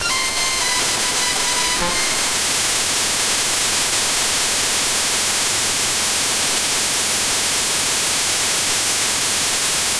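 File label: small baby crying; infant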